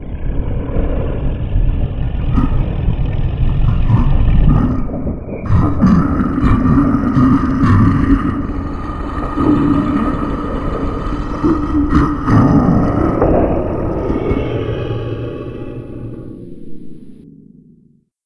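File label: Robot Death; Descent3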